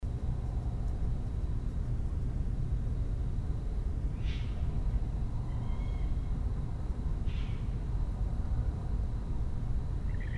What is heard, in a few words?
Northern Mockingbird protesting commotion